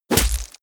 heavy punch gore short

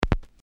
vinyl starting click

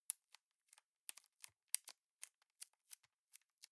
plastic bag small clasp close

